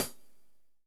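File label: closed hh, closed hi-hat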